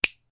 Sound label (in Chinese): 打响指的声音